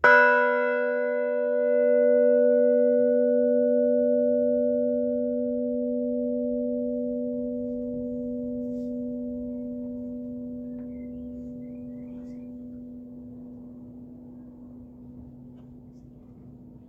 billingshurst five, bells

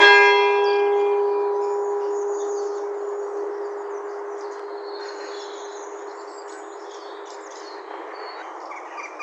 one, bells; copthorne

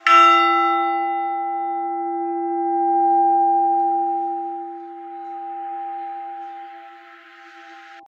bells, lower beeding old three

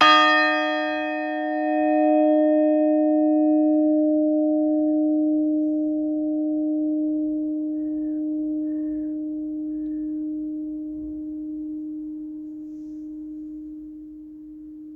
bells, funtington three

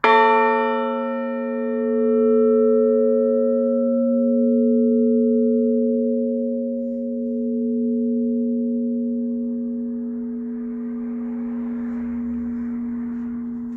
bells, turners six

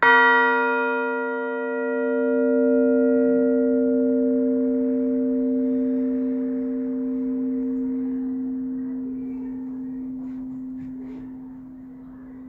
turners five, bells

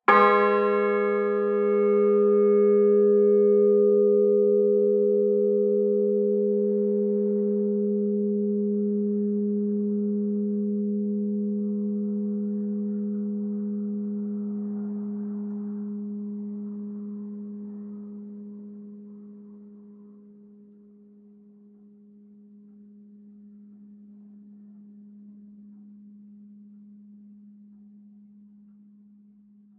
bells, fairwarp

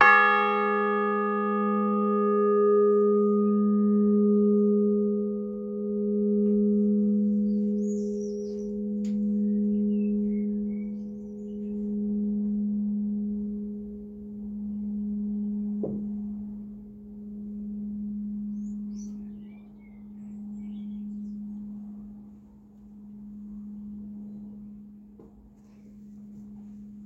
seven, bells; billingshurst

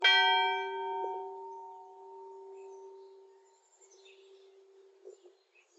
chidham two, bells

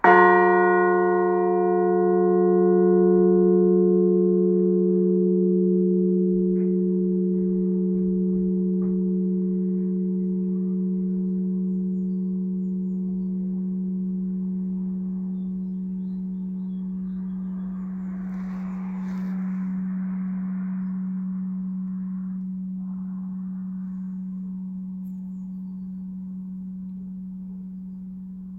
turners eight, bells

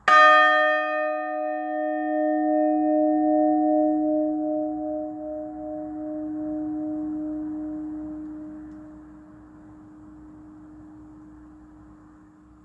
bells of sussex; milland

